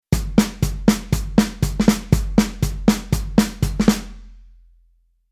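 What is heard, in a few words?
Rhythm Country pattern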